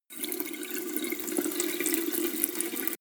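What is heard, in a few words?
sink water soft, bathroom; haus-garten; badezimmer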